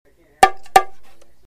bongo spokojnaya priglushennaya

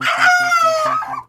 dvukratnij krik petuha

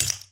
padenie steklyannogo oskolka na pol

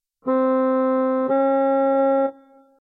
organ interval

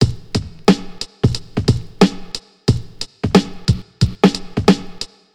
ritmichnij zvuk barabana

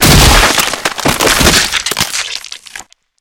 sverhmoshnij vzriv so mnozhestvom zhertv

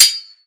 mech odinochnij zvonkij blizkij

